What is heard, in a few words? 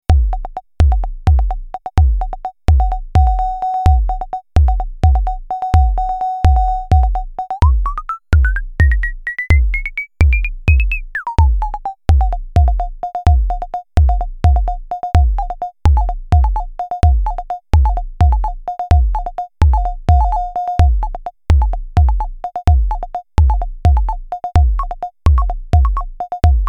twincussion sine hihat mod